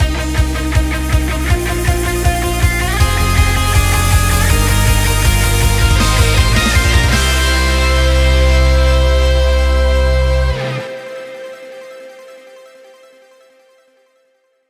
Game Clear; Bleed 2

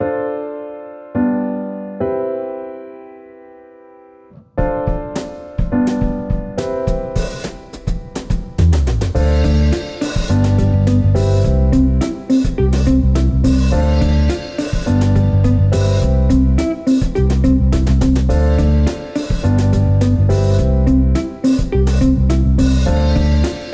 Instrumental; Friday Night Funkin' Friday Night Shootin' mod original soundtrack volume 1 weeks 1 and 2

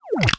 Robot

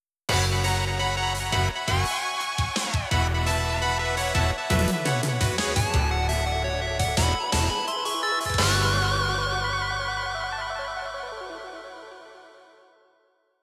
Victory; Wrastor

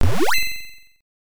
Coin; Guardian; arcade

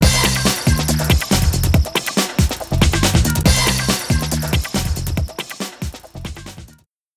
Disco; Disco Wreaking Ball; Skylanders Giants

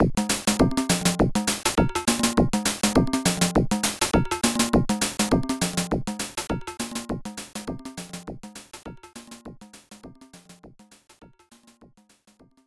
Level Clear; Snake Rattle n Roll Genesis

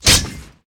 Weapon TwoHand Sword Critical; Inotia 3

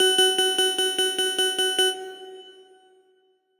Common Extend; Pac-Man Championship Edition 2 Plus

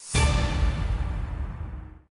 Menu Stab; buzz brain of the uk 2009 ps2

